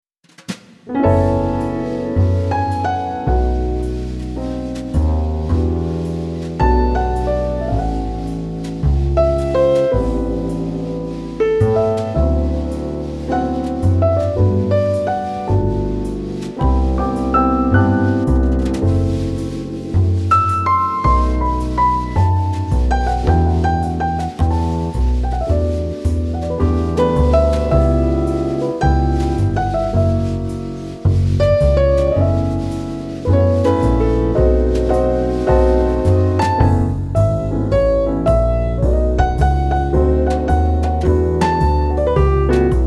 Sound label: Vintage; AbbeyRoad